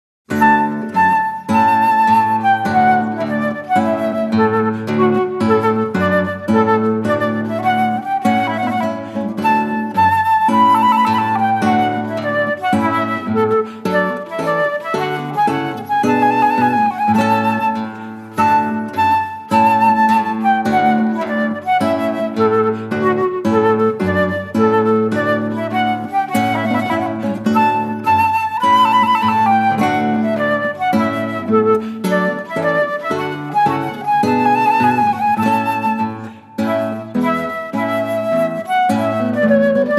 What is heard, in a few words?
trumpet tune